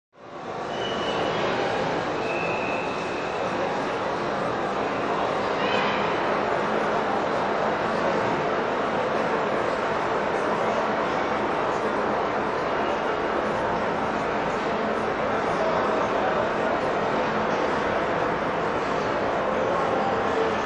Train Heavy Activity Marseilles Station Marseilles Railw; Hollywood Edge 3-D SFx